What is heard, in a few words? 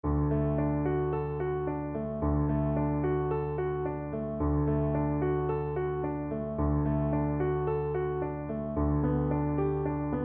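VLC karaoke, Cello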